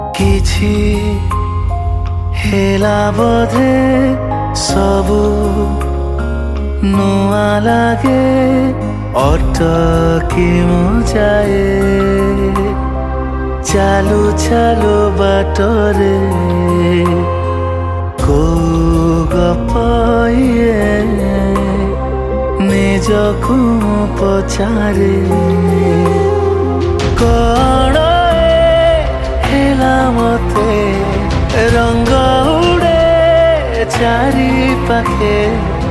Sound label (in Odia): Odia Movie New Song